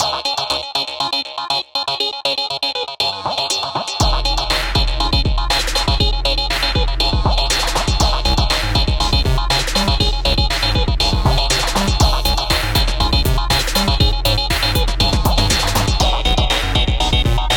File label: ending